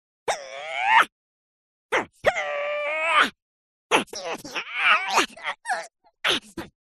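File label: cartoon heroes fight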